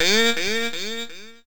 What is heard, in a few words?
"mmm" male echo, Voice; ooh ahh